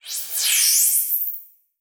Multimedia Internet CD-Rom Flash Electronic

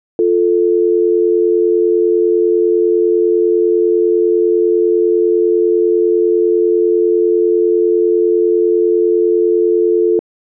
dtmf tone dial tone

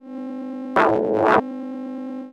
Sci-Fi Electronic Vertical Scanner; Science Fiction

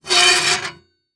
Foley Metal Scrape

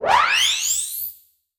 Multimedia Internet CD-Rom Flash Pop Up

